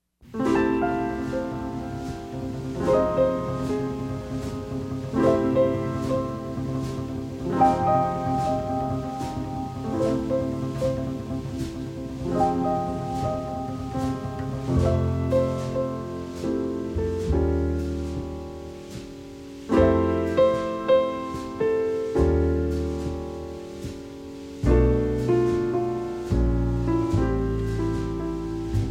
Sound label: (Instrumental)